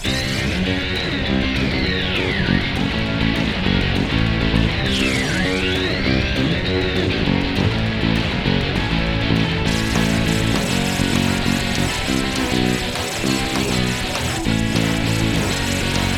race; Ty the Tasmanian Tiger

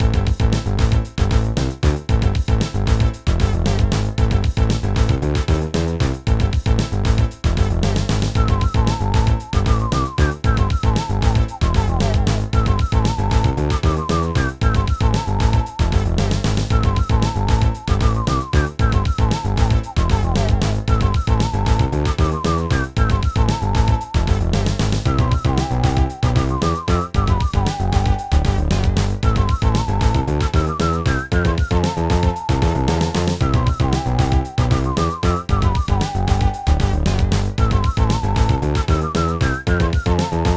Dance Pop; Garfield Gets Real; NDS

